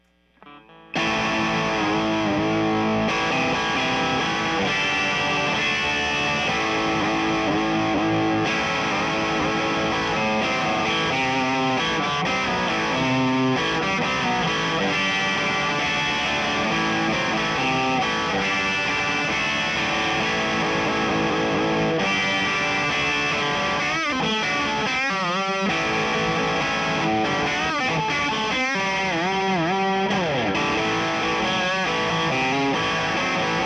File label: start channel 3